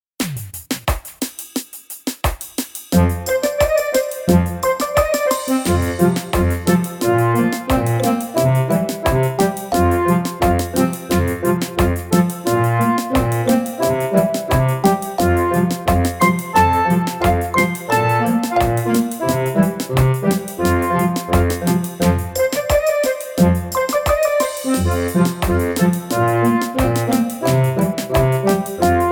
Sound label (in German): instrumental